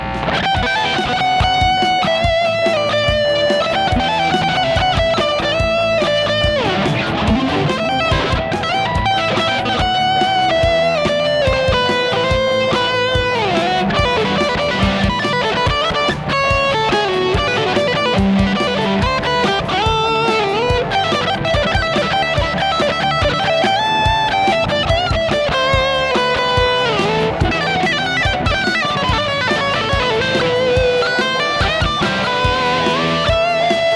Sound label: guitar rock